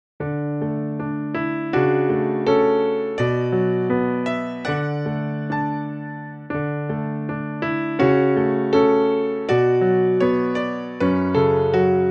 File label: song piano; manannan; ree ny marrey